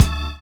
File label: FILL; Univers Sons - Kitch 70s